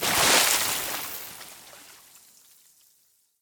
liquid

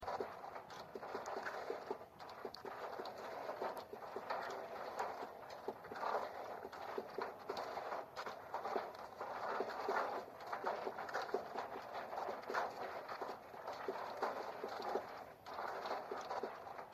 Sound of Windmill; WorldBox

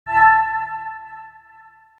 Coin Collect; Journey of a Special Average Balloon; Wii U

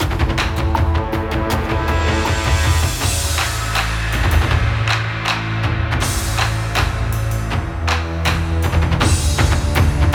TENSION LOOPABLE

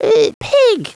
pig

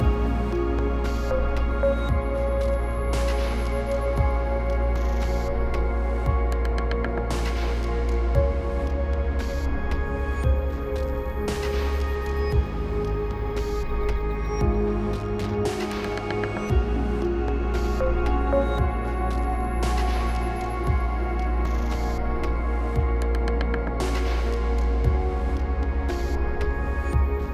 Exploration 57 5BPM

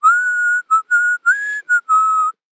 Flasher Whistle; fashion police squad beta

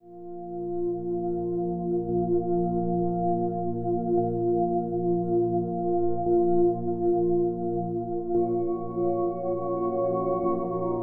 Overlay Drone

